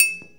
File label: triangle; AKAI S-Series CD-ROM Sound Library VOL-5